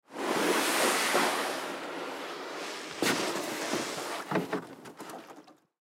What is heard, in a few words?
Canoe Push In Water; Transportation Watercraft; Dragging Sound Effects; Blastwave